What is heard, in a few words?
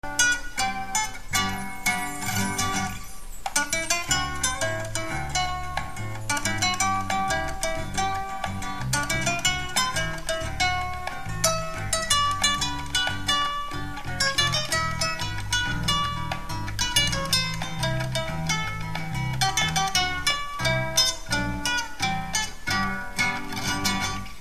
guit